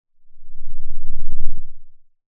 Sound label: Sound of a lion’s roar